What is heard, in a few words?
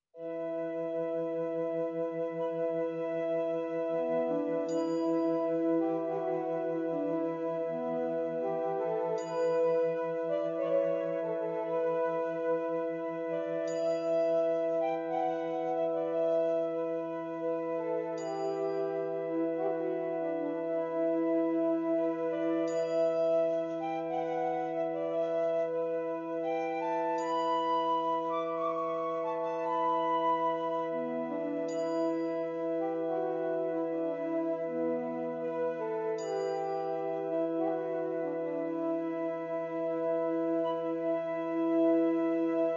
Flute Orchestra